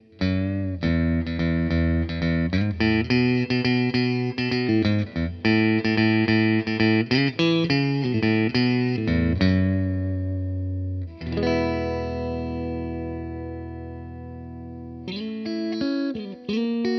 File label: Neck Middle Clean